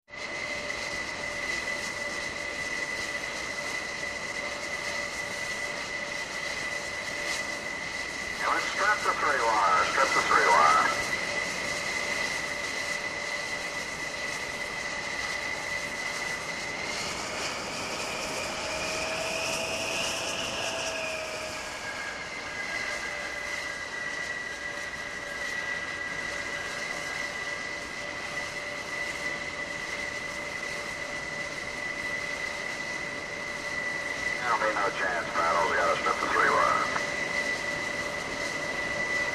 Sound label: Jet Idle Aircraft Ca, aircraft carrier jets